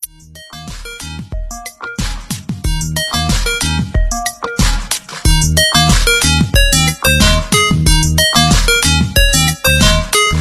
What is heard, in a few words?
Vivo V29 Lite Original Ringtone